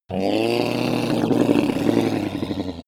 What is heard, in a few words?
reptile choke angry; kreaturen